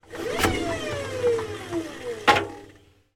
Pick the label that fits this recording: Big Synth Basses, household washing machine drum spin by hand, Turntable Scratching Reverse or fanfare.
household washing machine drum spin by hand